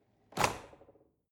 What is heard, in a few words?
drawer cutlery open lock soft